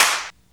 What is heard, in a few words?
CLAP HOU, Dance Mega Drums; Best Service